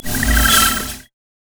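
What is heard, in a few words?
concussion grenade; LoL; heimerdinger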